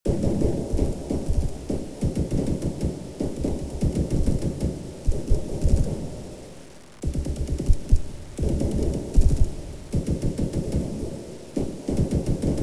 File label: battle